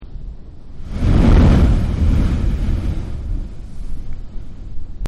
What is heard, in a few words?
Whale Blow Hole; Whales; Animals